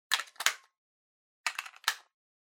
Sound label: Tabletop Timer Digital Battery Insert; タイマー機器